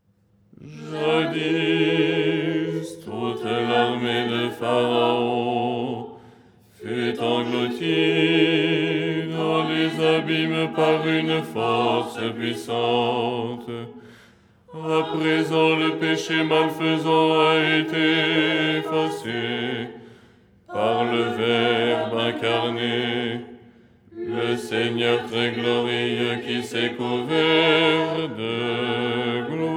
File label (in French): basse